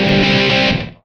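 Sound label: guitar chord (C); Single Shots; Misc; Sonic Foundry (Sony Creative Software); Ugly Remnants VOL-2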